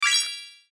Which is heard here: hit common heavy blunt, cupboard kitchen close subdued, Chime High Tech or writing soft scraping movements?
Chime High Tech